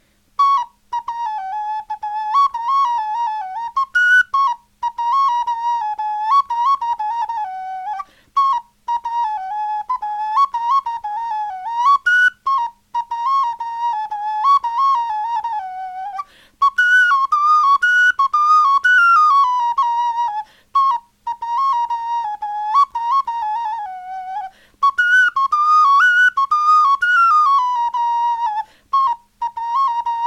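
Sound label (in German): melodie, Knochenflöten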